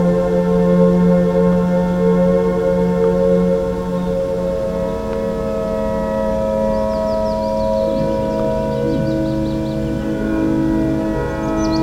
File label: warbler